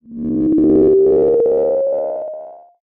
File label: Sci-Fi Electronic Musical Distortion; Science Fiction